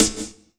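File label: snare drum, 170 BPM, Drum & Bass; Ueberschall; Drum & Bass Resonance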